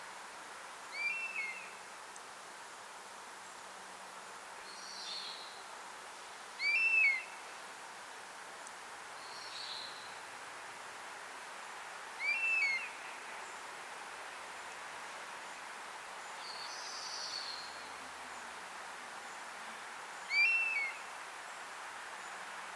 darázsölyv hangja

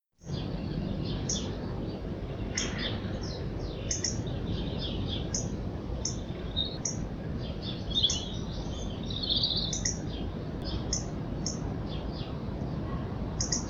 meggyvágó hangja

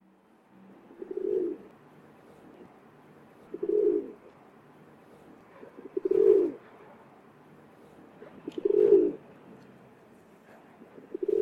szirti galamb hangja; hazigalamb

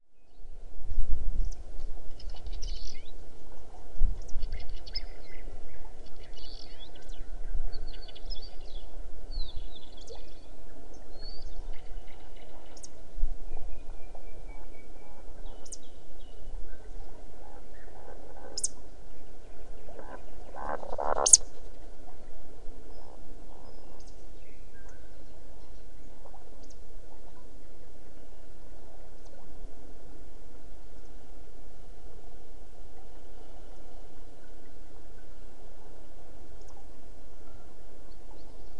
erdei szalonka hangja

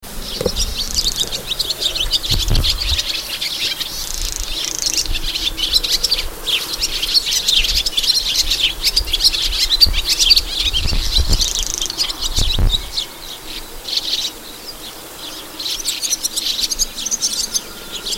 partifecske hangja

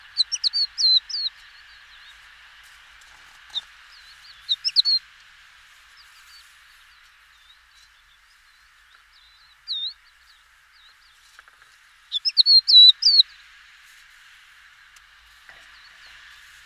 búbospacsirta hangja

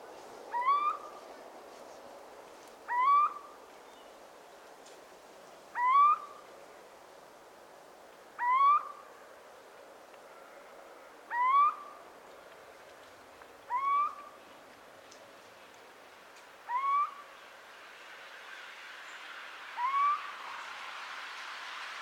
kuvik hangja

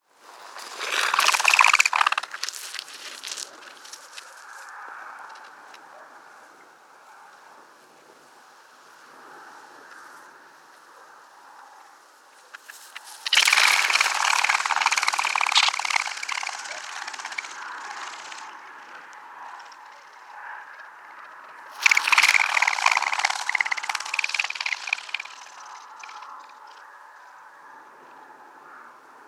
fogoly hangja

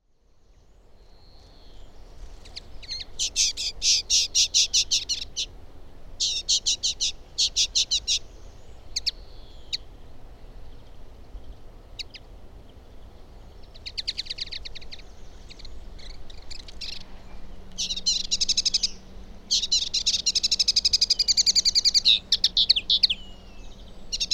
kenderike hangja

